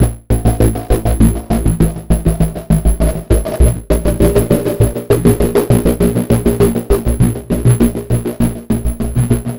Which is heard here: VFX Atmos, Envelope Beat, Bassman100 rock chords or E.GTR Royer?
Envelope Beat